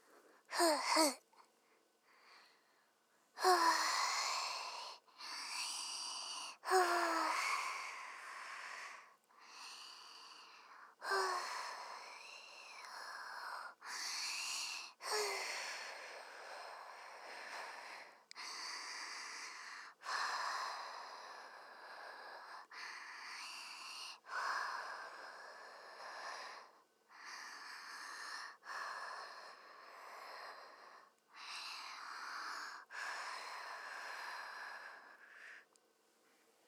ふぅー, ASMR

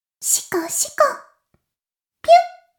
妹 しこしこ音声

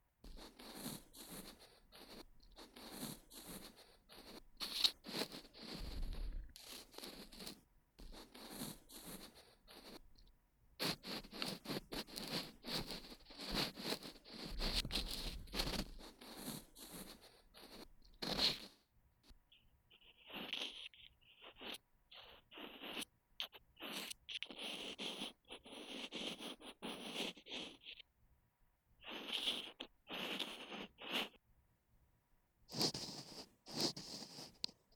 耳かき（左／環境音なしver）, ASMR; ミナセの耳かき

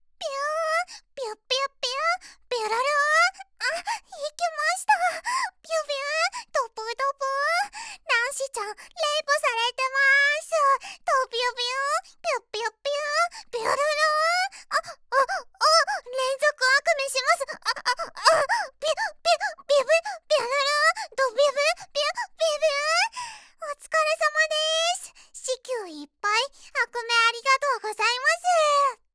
びゅ～～ びゅっびゅっびゅっ

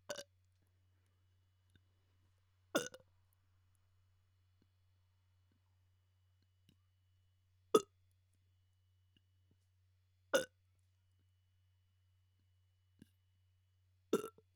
ゲップ; 声のみ

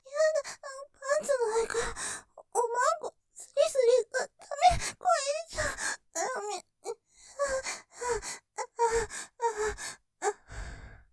喘ぎ, ASMR; パンツの上からおまんこすりすり；；らめぇ; ステレオ; 立体; ループで楽しむ！シェフの気まぐれ抜き抜きコース

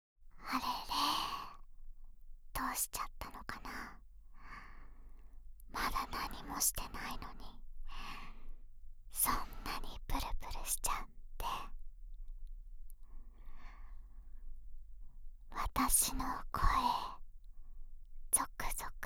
極・耳舐め左, ASMR

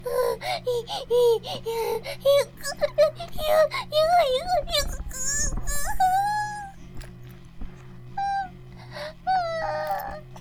バイブ放置, ASMR

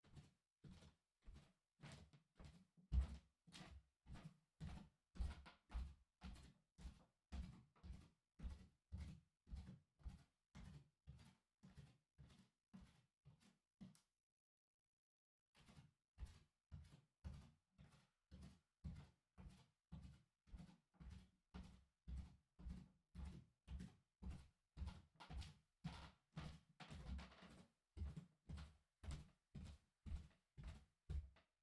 行ったり来たり L 中速, 裸足 足音; KU100

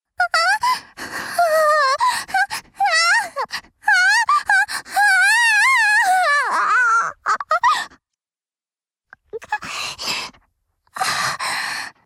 絶頂時の喘ぎ, ASMR; チュパ音抜粋集

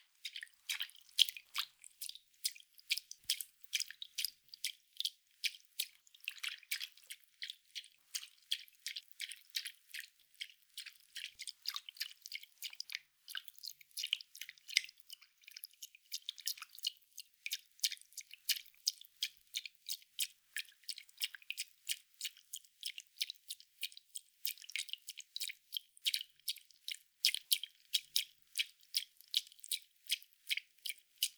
ぴゅっ, 水音効果音

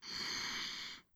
ブレス ロング

